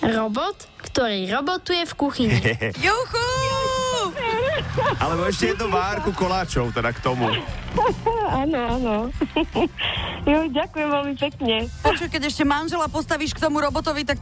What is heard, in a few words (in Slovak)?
kuchynsky robot